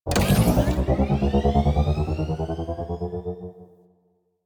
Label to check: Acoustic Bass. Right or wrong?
Wrong